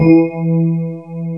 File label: PIA E2, piano